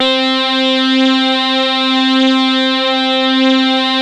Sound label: SAW RAVE, LEADS